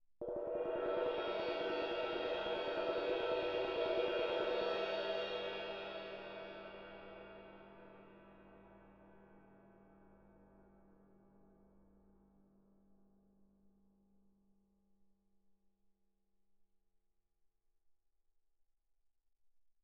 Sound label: 21 ride, mallet, roll, suspended cymbals; Percussion